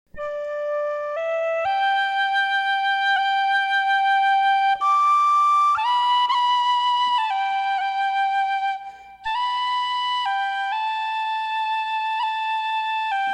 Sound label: Whistle Set; Rain and Thunder